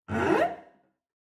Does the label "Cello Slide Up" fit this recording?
Yes